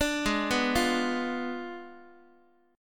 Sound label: Ab Diminished 7th Ab Guitar 4A,4E,5A,5D,5G sharp,6C sharp; arpeggiated; x,x,5,6,3,4